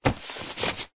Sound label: foley sheet of paper on cardboard box rub with hand